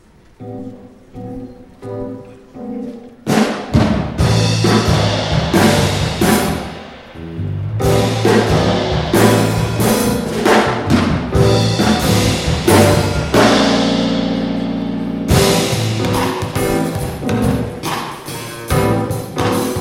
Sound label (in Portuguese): musica jazz trio; marques jam